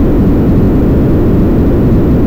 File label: Thrust; Defender